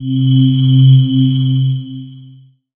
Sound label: pad, Dance Synths; Break Pads; Best Service ProSamples vol.37 - Dance Synths